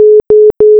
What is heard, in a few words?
error; EQSO; IQSO REGISTER V.3